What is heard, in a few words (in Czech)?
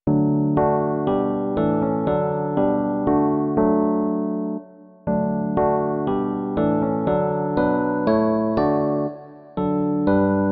jazzové piano; Imaginativní verze